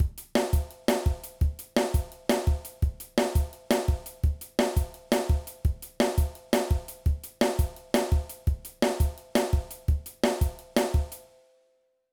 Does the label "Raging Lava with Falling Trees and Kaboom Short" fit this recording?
No